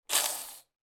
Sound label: human toddler 18 months laugh titter